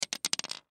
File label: Thumb Tack Set Down Wood Desk; Set Downs; Objects; Blastwave